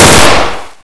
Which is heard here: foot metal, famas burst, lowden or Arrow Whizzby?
famas burst